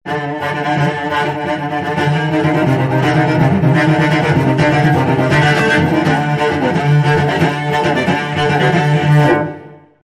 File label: Cello Danger Excitement